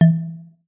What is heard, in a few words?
marimba Tone E3